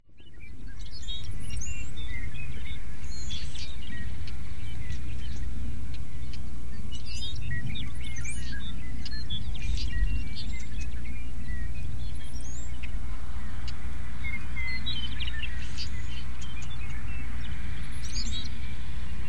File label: Brewers Blackbird